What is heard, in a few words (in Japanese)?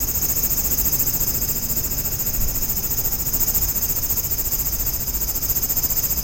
aburazemi